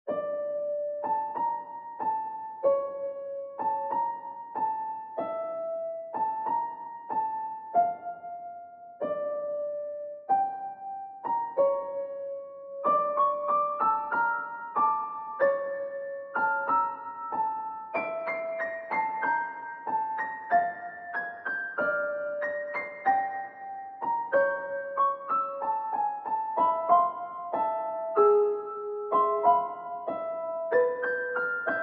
music box fugue

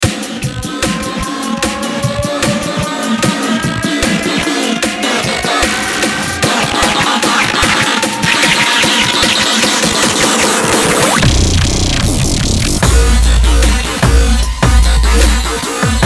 hybrid trap; weeklybeats-2020

